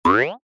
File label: cartoon spring boing jump jaw harp